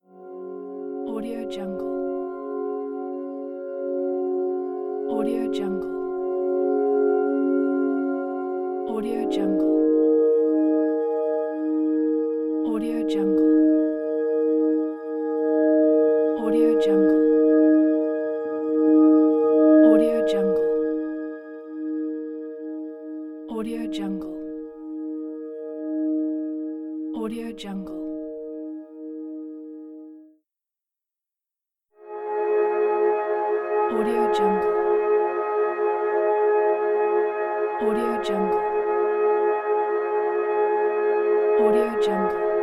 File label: tranquil ambients; livingroomclassic